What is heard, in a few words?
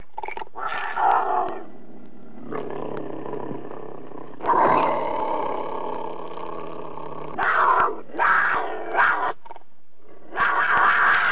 cougar snarl growl, animals; zvuky zvirat